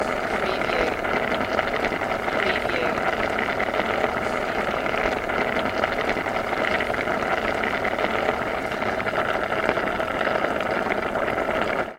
Boiling

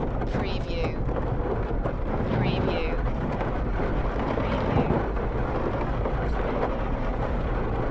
Heavy gate sliding loop

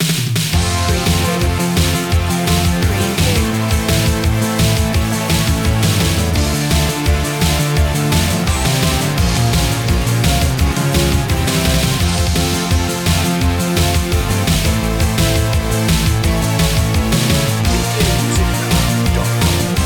j-pop victory underscore

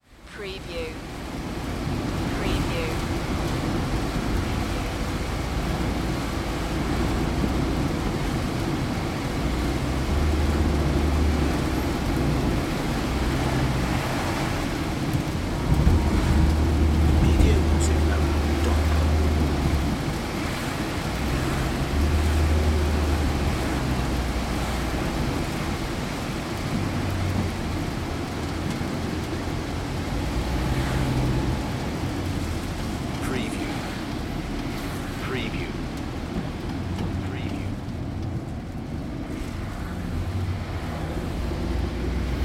taxi interior driving rain Kyoto Japan

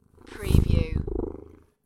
Cat sniff purr; beanstalk audio

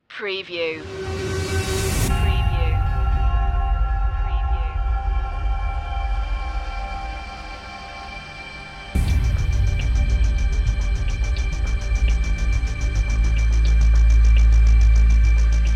short thriller trailer

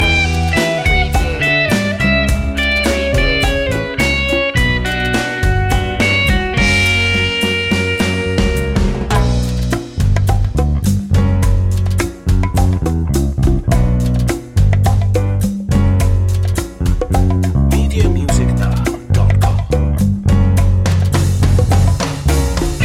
latin heart loop

